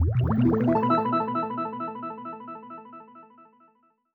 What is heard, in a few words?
bubbles; netent